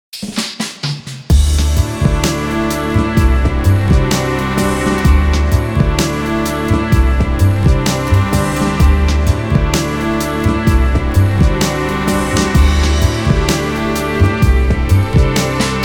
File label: abstract lounge